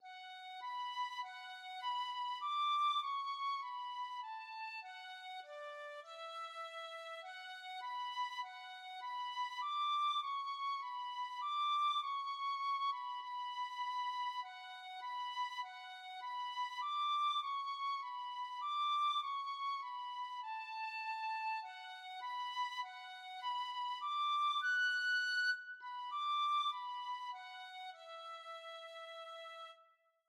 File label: wonder wander, tin whistle